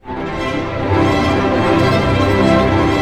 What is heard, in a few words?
ARP C, STR ARP MAJ; Sound On Sound - Peter Siedleczek's Orchestra